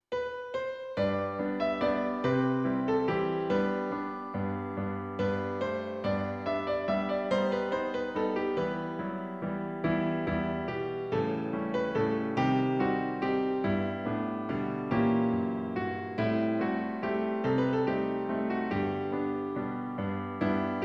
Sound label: Waltz; Rimsky